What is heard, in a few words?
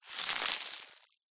stones small debris fall down pile; foley